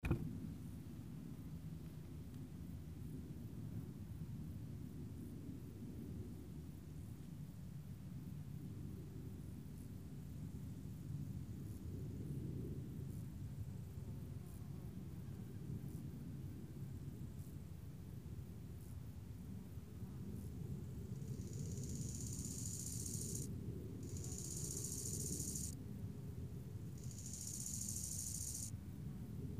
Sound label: Crickets Solignac France